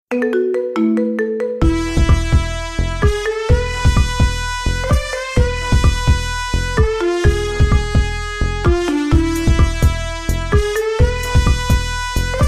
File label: marimba east remix